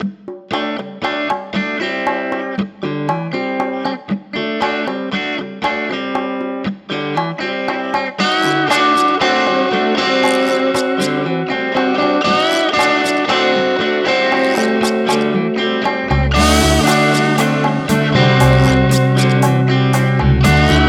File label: Mix wo vocals